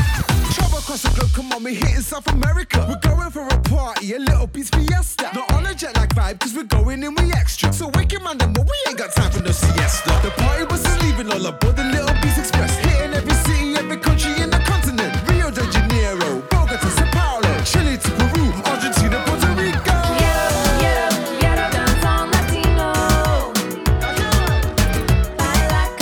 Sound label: Latino Beatz